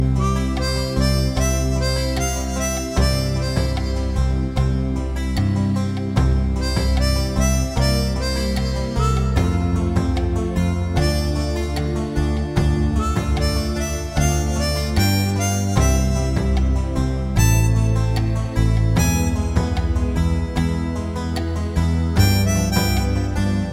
harmonika

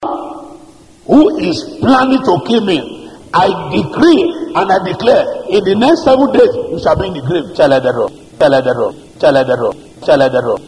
I say ooh

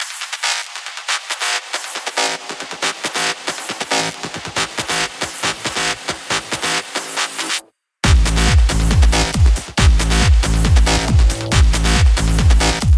cooool trance

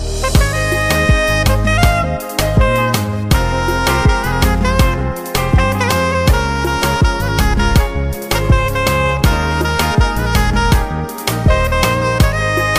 Saxophone

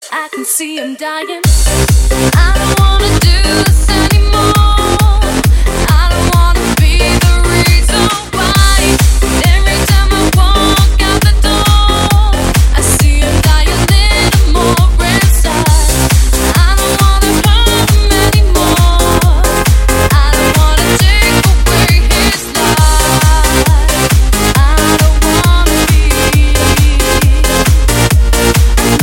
disco melody

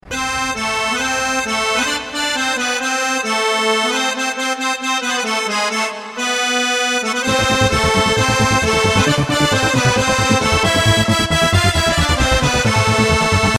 SMS, ringtones

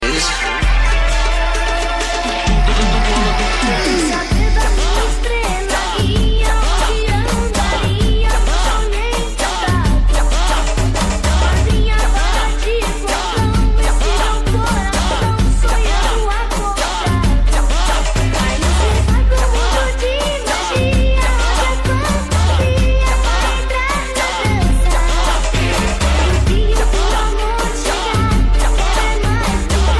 Funk

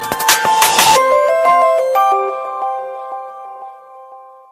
super android sms

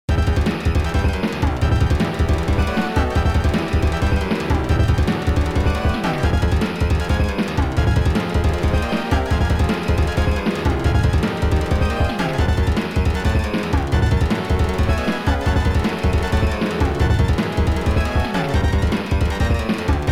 fast music; Honda

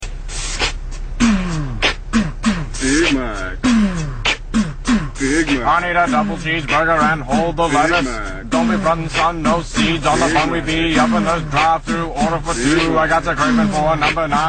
Rap SLOW

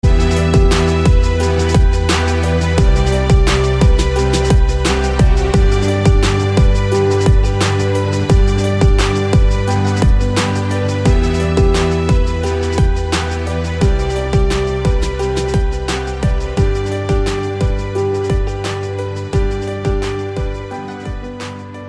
Romantic Beats

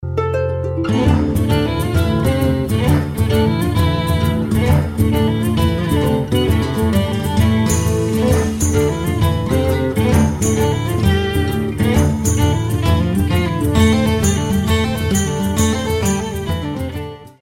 tambor; hearthstone

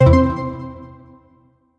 Cool Message

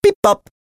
Bibob

